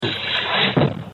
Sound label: Elmshorn Impact, meteorite; horn meteorite now on display at the hamburg museum of nature hamburg